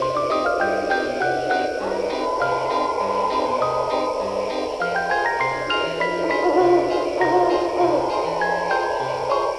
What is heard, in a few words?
sneeky music; tfc; soldier4hire